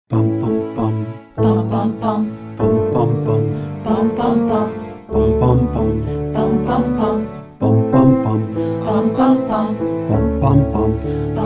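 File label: Chromatic Bum w Group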